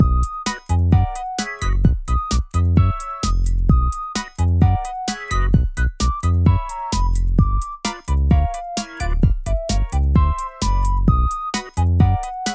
Tutorial, 130 BPM; Bits & Bops Demo

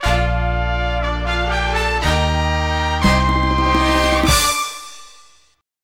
Boxing Replay Jingle; EyeToy Play 2

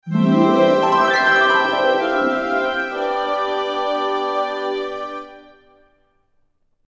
Field Deactivated

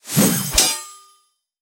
SFX Int Dungeons Open Level; mighty party 2017 gamerip pc ios android

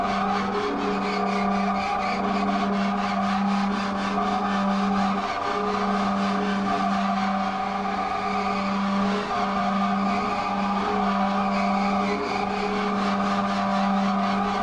BGM Scare; the letter a horror visual novel switch gamerip 2021

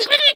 frustration; Scrat

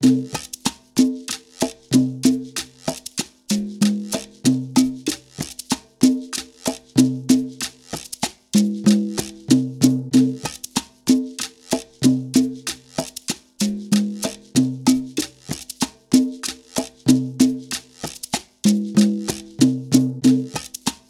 Salsa Loop, 8 count; zumba fitness join the party; ps3; wii; xbox 360

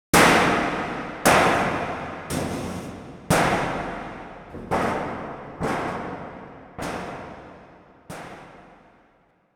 Object Falling Down

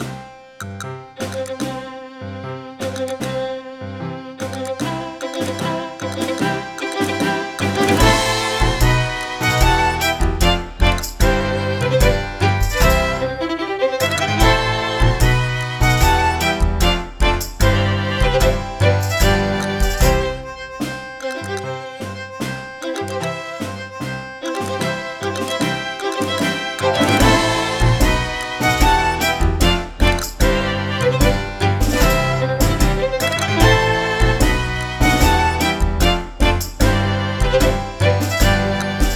Wedding March; The Jackbox Party Pack 10

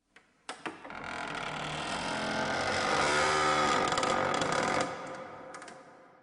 Door Crack; Five Nights at Wario's

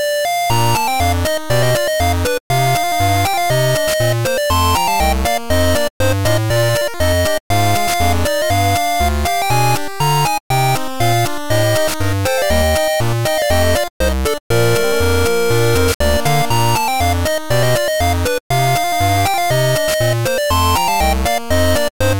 Funny land; puchicon; dsi ds

